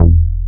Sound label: JNO06 BS E2; Drums In Your FACE!